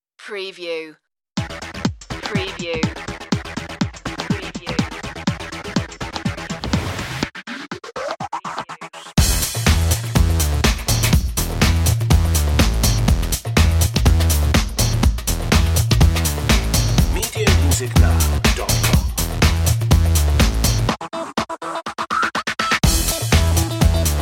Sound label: funk house